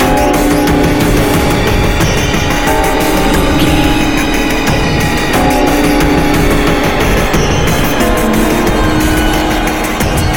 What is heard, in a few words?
lullaby modern horror